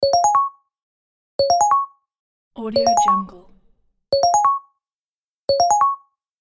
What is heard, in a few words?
digital ringtone